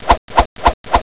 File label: Shuffle; lianliankan